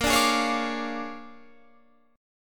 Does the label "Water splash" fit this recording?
No